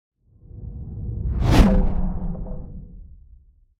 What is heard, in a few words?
Cinematic Woosh